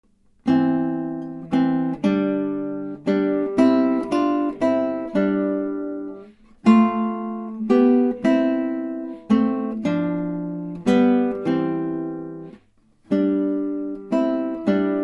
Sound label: study; giuliani